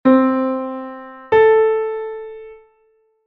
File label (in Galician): 6ª Maior DO-LA